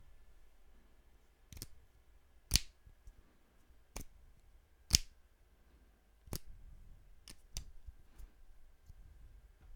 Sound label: Remove The Cap Of A Marker